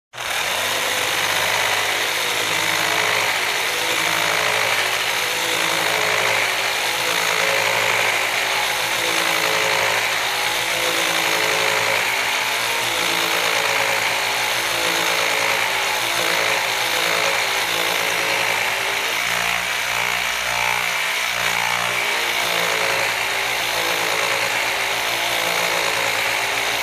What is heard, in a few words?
Construction